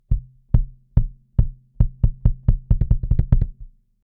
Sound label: kick; SSL X-Limit